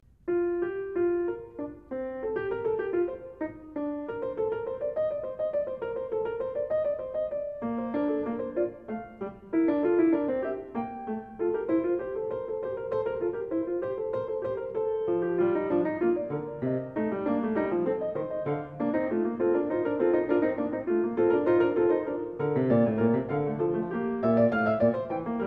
Prelude And Fugue No.21 In B-Flat Major BWV 866 Fuga